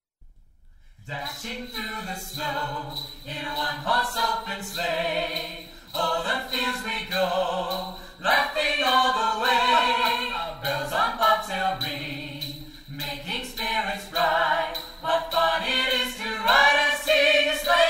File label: Jingle Bells